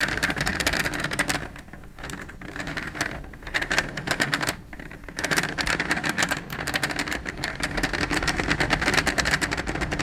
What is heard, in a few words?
wheeled chair rolling on bumpy surface; objects